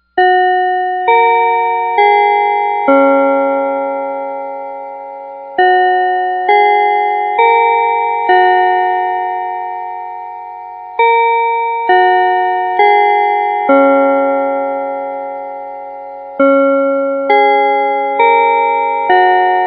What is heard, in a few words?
school bell